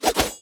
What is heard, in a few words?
Dagger shot